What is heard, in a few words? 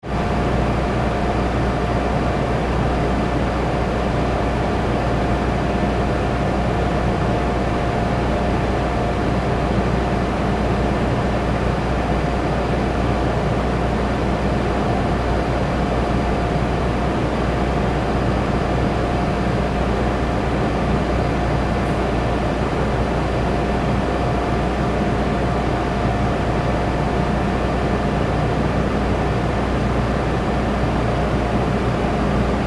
Ship Oil Pipeline Service Vessel Decompression Chamber